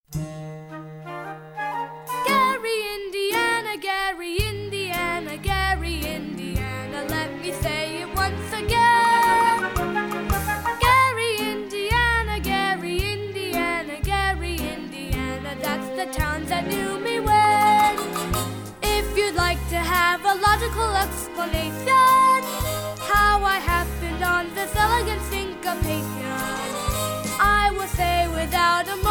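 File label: With Vocals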